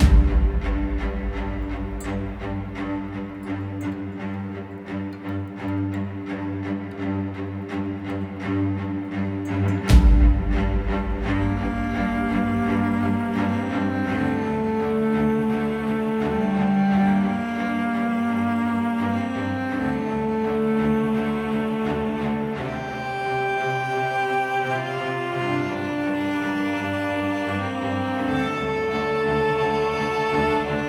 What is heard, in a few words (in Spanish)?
exploracion